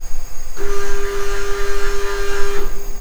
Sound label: coll cont move